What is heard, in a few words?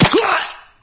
wolf; cstrike